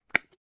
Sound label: ointment begin, Medical